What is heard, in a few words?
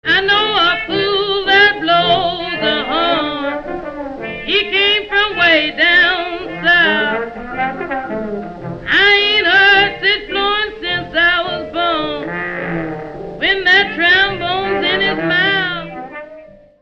trombone